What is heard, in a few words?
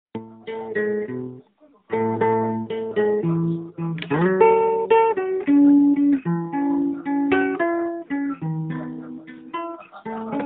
riff